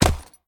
pet footstep; smite; lancelot

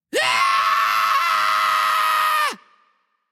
battle cry; smite; loki

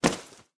clap; Smite; god Kukulkan v5

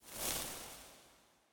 fire; smite; hades

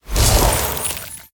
cast ice; smite; god merlin

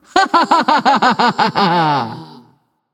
"yay"; smite; voicepack; nuwa

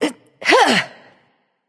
grunt attack; smite; medusa

